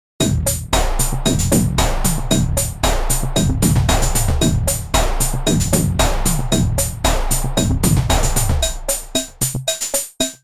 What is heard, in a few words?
drums